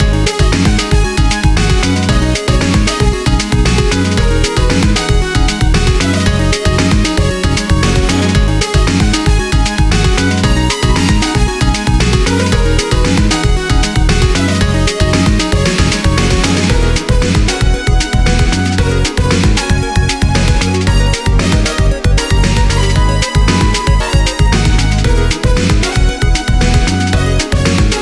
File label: Stage Loop; Cipher Circuit